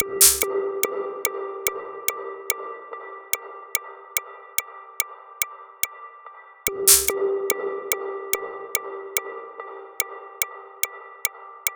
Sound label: music